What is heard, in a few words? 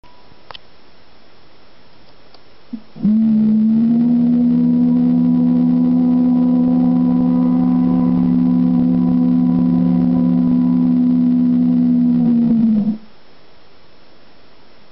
Swindon Railway Works Hooter